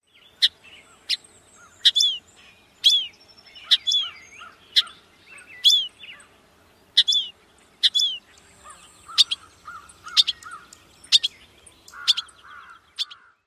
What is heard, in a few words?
various calls; NY